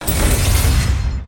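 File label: dead gate spawn; monster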